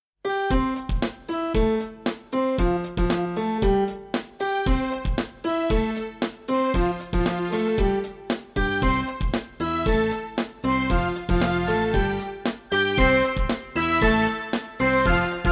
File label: with vocals